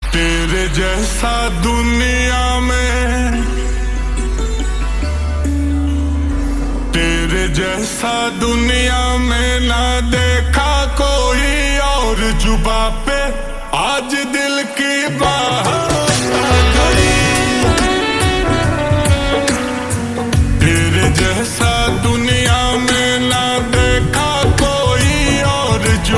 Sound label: Slow Reverb Version Ringtone